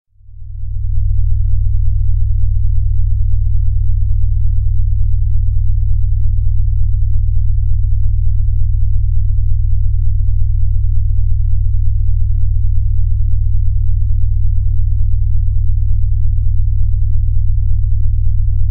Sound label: Brainwave Enhancer Delta